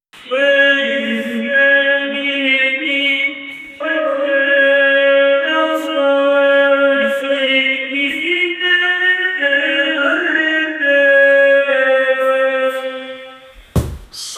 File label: a sasquatch singing an op, creature